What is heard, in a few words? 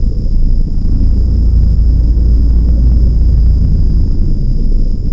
cairn reactor, ambience